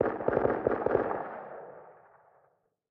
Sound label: ambient shooting; STALKER